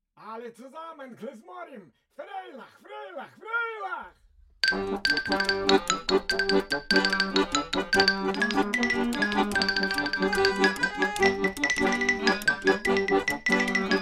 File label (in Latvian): kazu muzika; berdichever